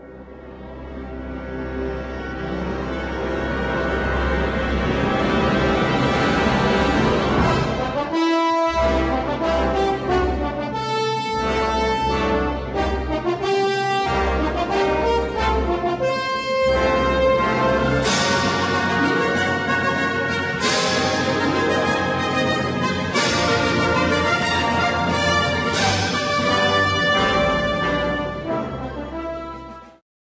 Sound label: Crossbow